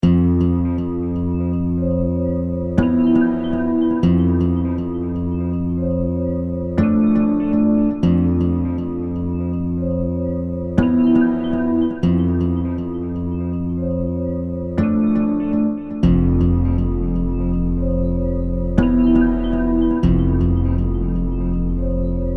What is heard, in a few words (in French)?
defaite amere synth